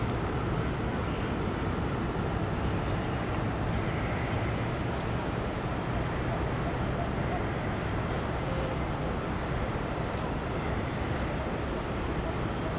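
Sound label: Gibraltar vista, exterior; ENV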